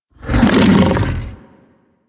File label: Predasite Vocal Attack Short; Warframe; Hunting Critters